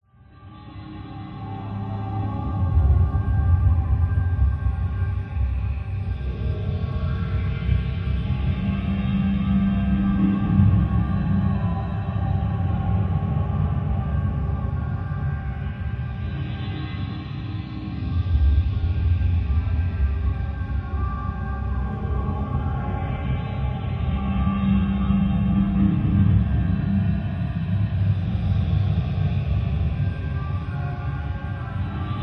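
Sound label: science fiction forcefield energy source dark deep mysterious eerie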